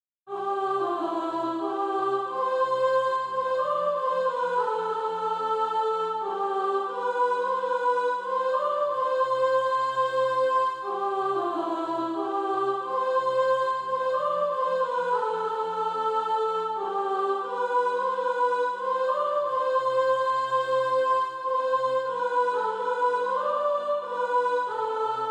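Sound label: soprano, SATB Practise Music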